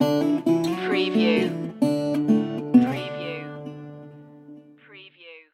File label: sand and rust stinger